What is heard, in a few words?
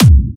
Bassdrums Dirty; Kicks; vengeance essential club sounds-3